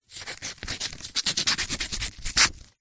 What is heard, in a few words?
foley paper cut slice open knife letter